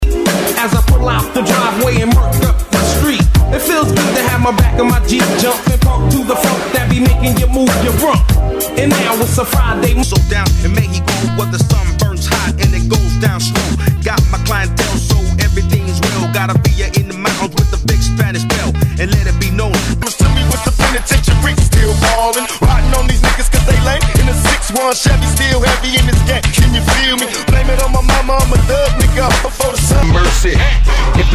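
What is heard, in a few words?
Old School Hip Hop Megamix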